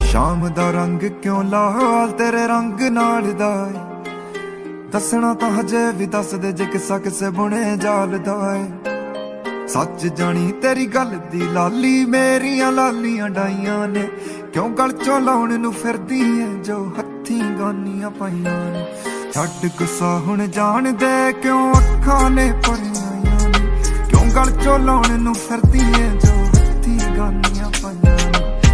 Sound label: Ringtone